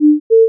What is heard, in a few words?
hint